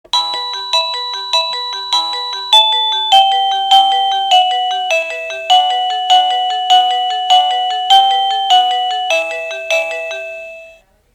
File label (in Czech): melodie